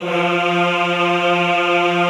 EHH F2, Classical Choir; Best Service

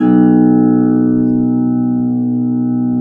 B1 HARP; USB Soundscan vol.62; AKAI

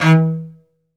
SOLO CE, Strings; ILIO

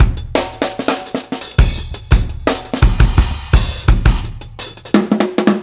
Bon Bon Kit 4 Bar, Drum n Bass Beats; KJ Sawka; Drum n Bass & Beyond

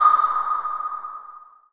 noise fx; Masterbits; Switch FX

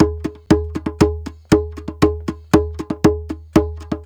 CLAV PERC; USB Soundscan vol.32; Roots Disco; AKAI

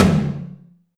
Tom Hi, Alesis DM5

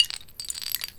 Shell Loop, Ambient Twinkles; Cool Little Shell Loop

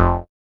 BAS F1, Yamaha CS40M synth bass

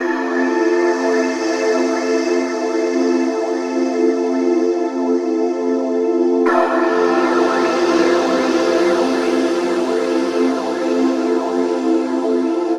Pad Oceanic, Drum & Bass Pads; Sonic Foundry (Sony Creative Software); Knutrix Drum & Bass

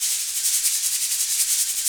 shaker, Africa; SHAKER FX; Spectrasonics; Heart of Africa 1

